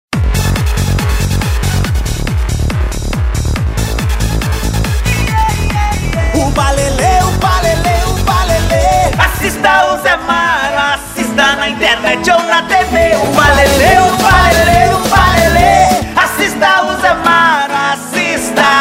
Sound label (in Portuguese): upa lele vinheta cantada